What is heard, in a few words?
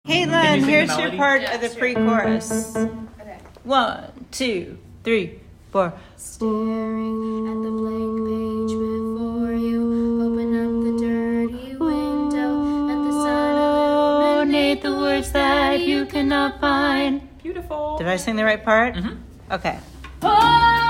Pre-chorus